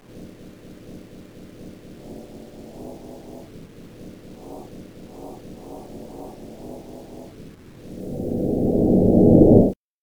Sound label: reverse cymbal; bass